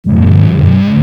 stun count; cso

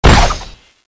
explode; thanatos5; weapons

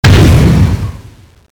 shoot, weapons; airburster

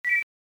beep, weapons

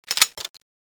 g11 clip in

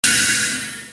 water cannon shoot end

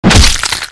boomer stab; cso

